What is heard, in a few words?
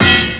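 ANVIL, percussion metallic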